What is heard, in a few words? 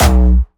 B1, Jumpstyle Kick; Bassdrums; Jumpstyle Multis; vengeance essential club sounds-3; VEC3 Bassdrums